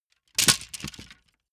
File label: wood sticks small break